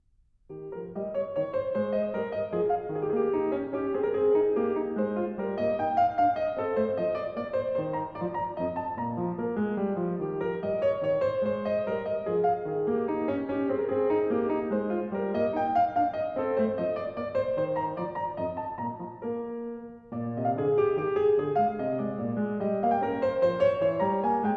French Suite #4 In E Flat Menuet, KLAVIER; BWV 815; BACH

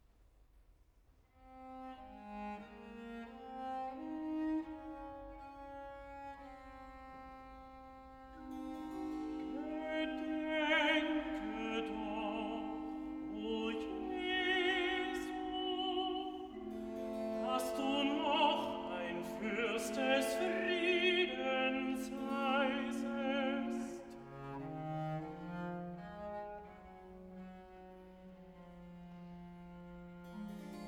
Recitativo (Tenor); BWV 116; Gedenke doch; Du Friedefurst, Herr Jesu Christ; BACH CANTATAS

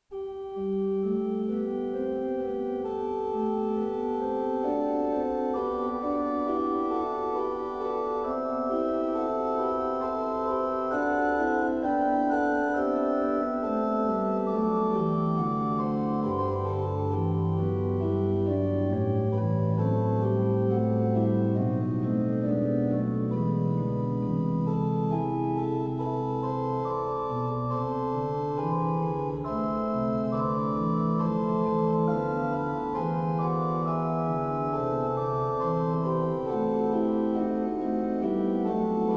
Kyrie Gott Vater in Ewigkeit, organ; Orgelmesse; Bach; Bach Organ Works; Choral Preludes

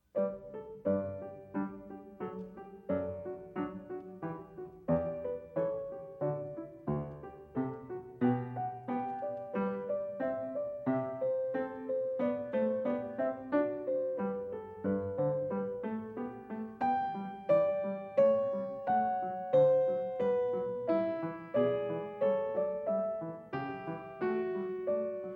Bach Goldberg Variations a 2 Clav, PIANISTS

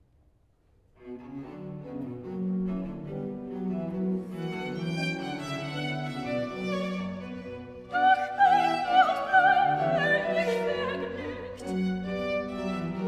aria, soprano; vocal; BWV150; Doch bin und bleibe ich vergnugt; BACH

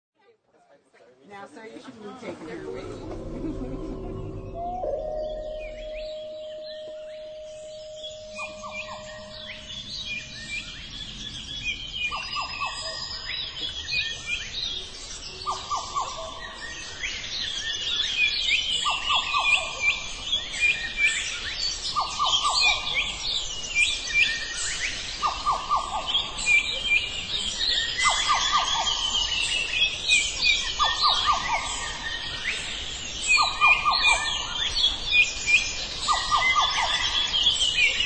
Dawn Chorus Madagascar; ambient; Douglas Quin

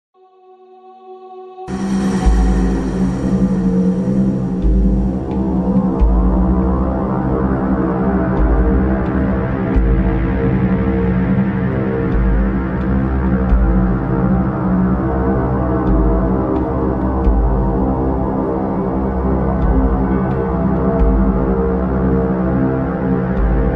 Breeze

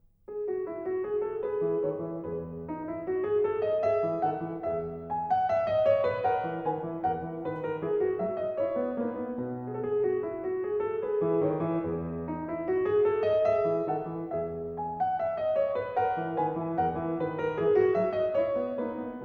Menuet, KLAVIER; French Suite No 6 In E Major; BACH

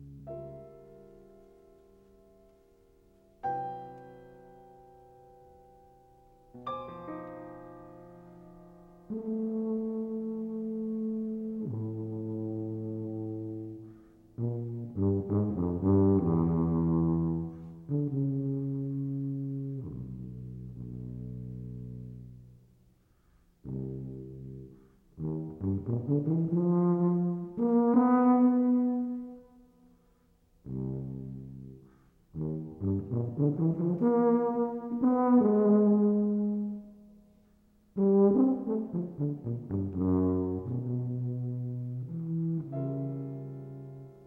Sonata for Bass Tuba and Piano, Lento; HINDEMITH

